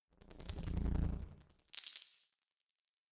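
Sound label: organic scuttle short, science fiction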